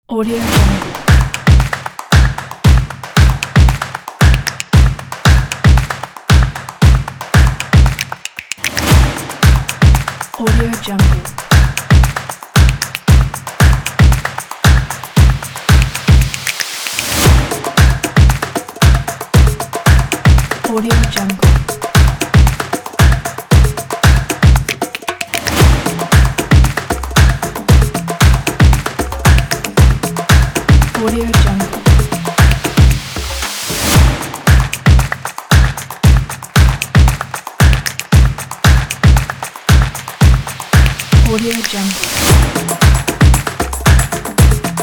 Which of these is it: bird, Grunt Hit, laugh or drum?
drum